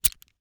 Nunchaku Creaks, weapons; AdobeCC

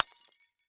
Crash Light Bulb Break On Cement; crashes; AdobeCC

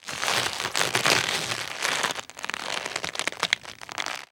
Foley Plastic Crunch Long

